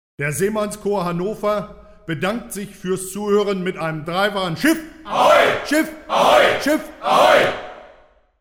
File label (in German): 3x Ahoj, Seemannschor